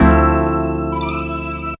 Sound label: Staby; Zero-G Jungle Warfare 1, 2 & 3; 90 s Sample CDs